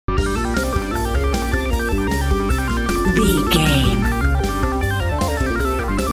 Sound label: video game boss battle loop